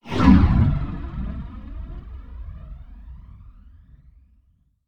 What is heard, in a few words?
Blowing Flyby Whoosh Fast